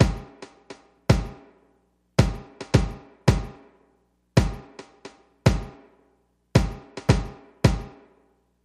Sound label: Ambient House Kick Tom 110BPM